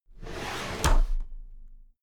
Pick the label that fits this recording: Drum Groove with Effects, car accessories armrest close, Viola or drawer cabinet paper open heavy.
drawer cabinet paper open heavy